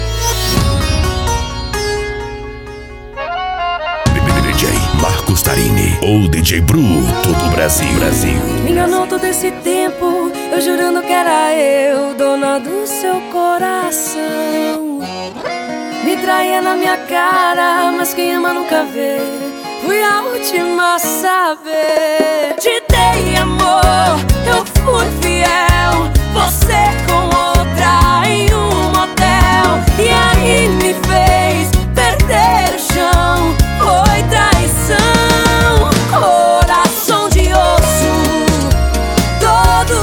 sertanejo; balada g4

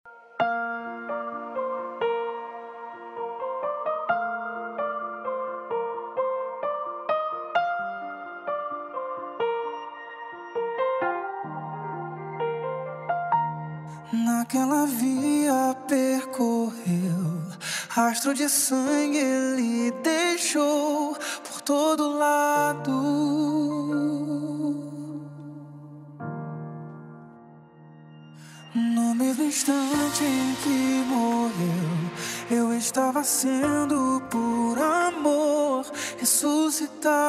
remix gospel

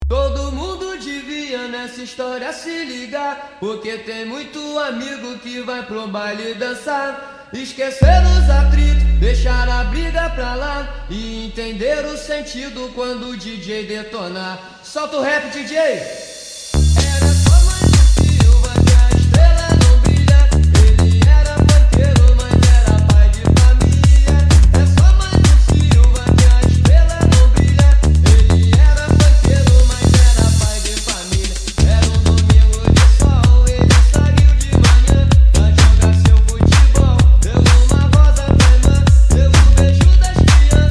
retro

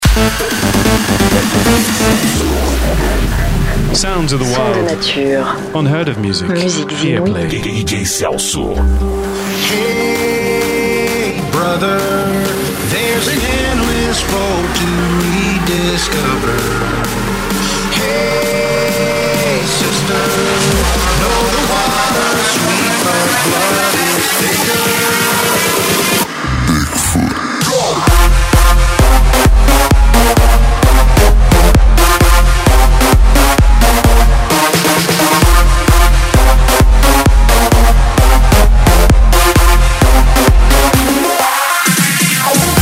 electro house

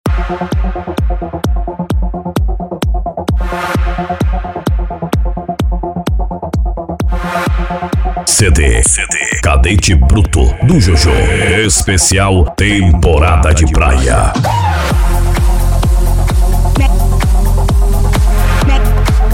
electro funk

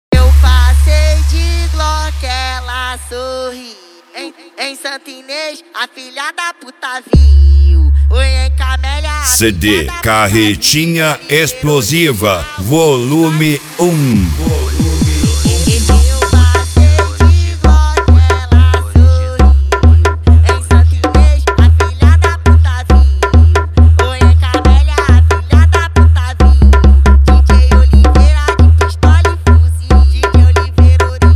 mega bass

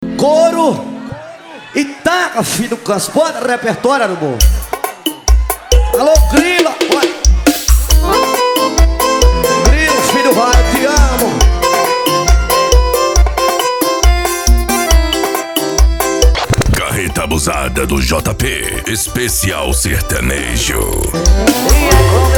sertanejo

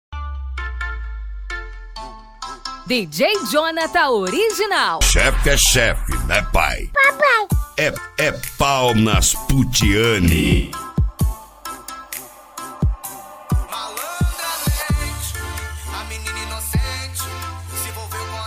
trap funk

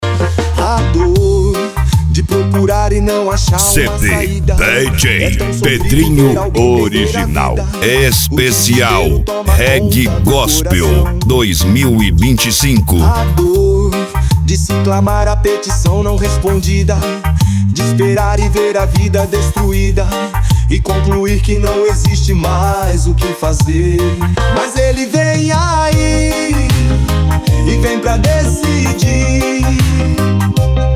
reggae gospel

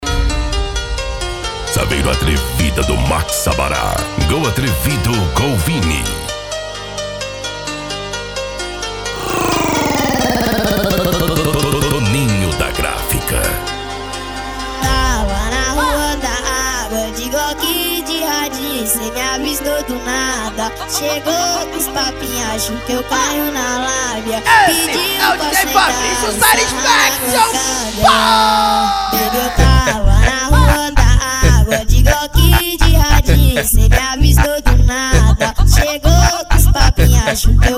funk mega bass

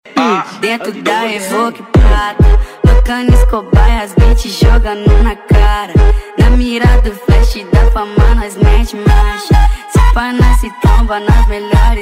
beat; cd saveiro madalena esp mandelao 2023

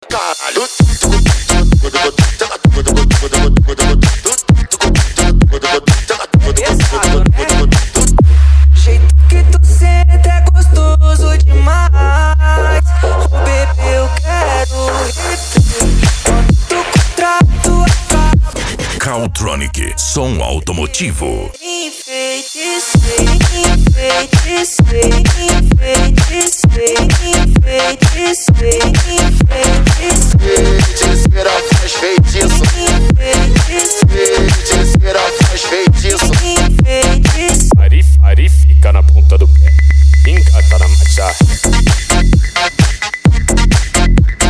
mega funk e funk mega bass

